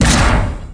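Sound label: drop weapon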